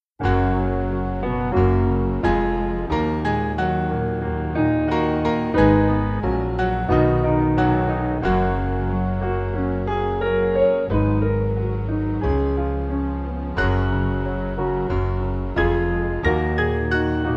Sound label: Piano